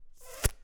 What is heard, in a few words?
bow hit; minecraft; SMCNV-pack